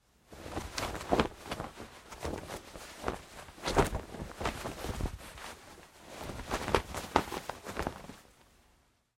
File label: Foley Fight Moves Jeans Struggling; Wrestling and Struggling